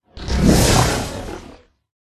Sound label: jelly fish boss attack voice bottom